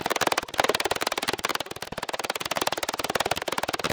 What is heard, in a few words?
loop, techno atmos